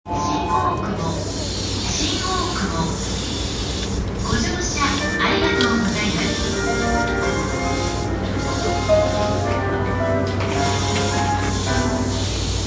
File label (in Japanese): JR 山手線 新大久保 CCW, train sounds